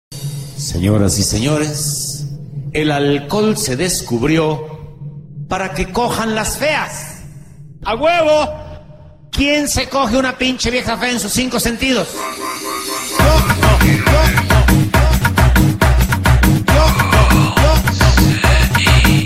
polo polo tribal; latino

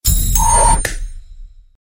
Coin Toss

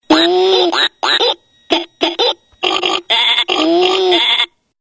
Beat

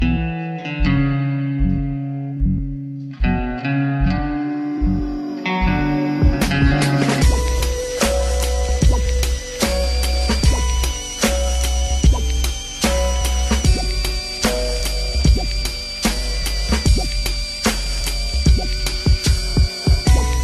Trippy Hip Hop

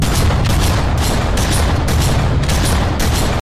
gauntlet Sound; Yang

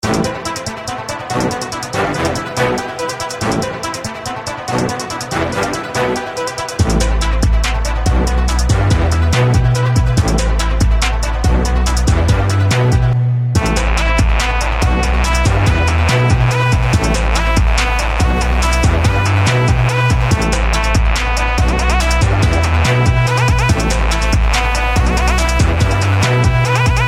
ORCHESTRA HIT TONE

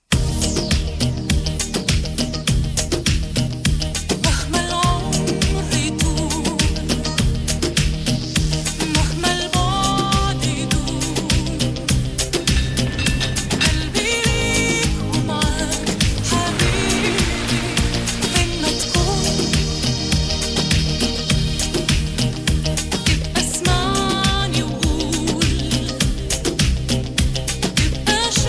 tune; vodafone; blues

